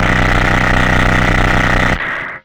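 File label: RUMBLE left, Hard & Loud Techno; USB Soundscan vol.01; AKAI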